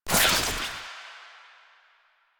Jade Scythe Spin; Weapons; Stalker; Warframe

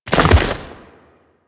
Roll Forward Foley C; Enemies; Warframe; Teshin; DE

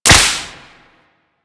Whip Impact Default, weapons; Grn Whip

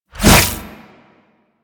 Briefcase Scythe Whoosh E; Crp Briefcase Scythe

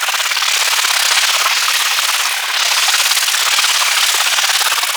Void Arena Plasma Proj Loop; Warframe; Quests; Wraith Quest

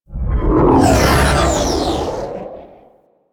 Sentient Ship Close Short Fly By; Warframe; Enemy Ships; Space Fighter Sentient Coil Agent

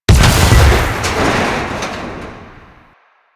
Pillar Partial Explosion; Warframe; Corpus; Jackal Redux; Abilities

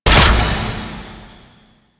Short Bow Fire; Warframe; Duviri; Dax; weapons

